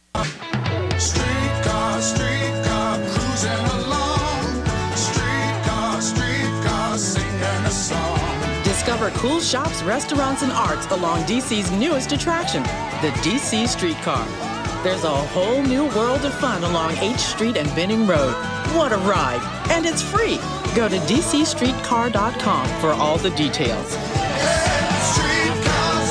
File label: streetcar jingle raw